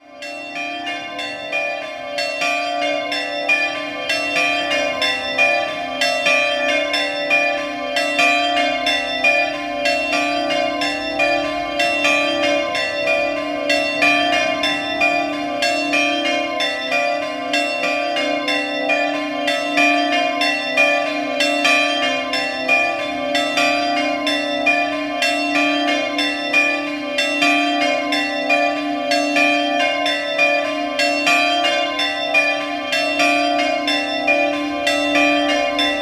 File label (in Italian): Cordenons San Giovanni, campane